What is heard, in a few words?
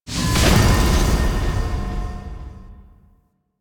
UI Scrap Spawn; Warframe; Missions; Zariman; Zariman Assassinate